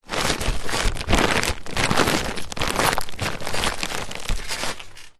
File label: trampoline edge pad footsteps; zapsplat